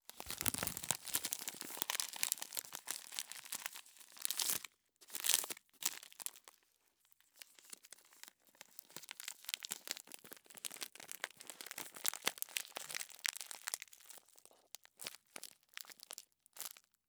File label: tea, leaves, loose, bag, plastic, foil, rollup, seal, rustle, crinkle; Eiravaein Sound; Vaeyan III